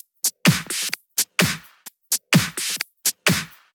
Drumloops 128BPM

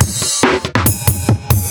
Loops Fills; Vengeance Samples

